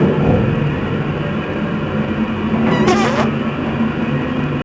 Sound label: Lift Run; GTA - Long Night